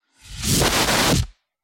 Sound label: Short Heavy Fire Exti, fire extinguisher